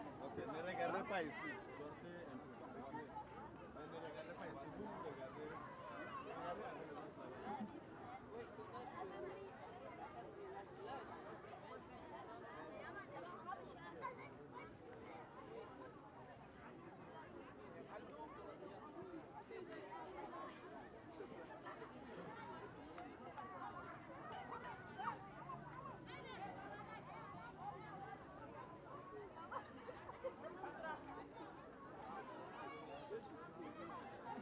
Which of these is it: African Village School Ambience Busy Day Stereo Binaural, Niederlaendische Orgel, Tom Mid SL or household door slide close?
African Village School Ambience Busy Day Stereo Binaural